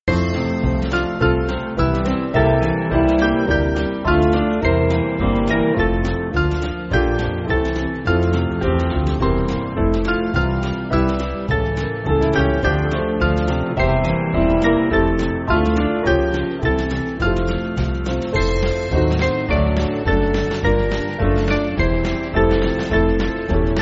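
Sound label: Band, Music Backing Files for Churches; Hark The Sound; Moultrie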